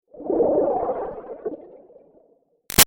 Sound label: Movement Bubbly Chop Away; Sound Design; Rushes; BigRoomSound